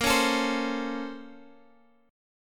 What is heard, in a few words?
A sharp Minor Major 9th, down, Guitar, Half Step Down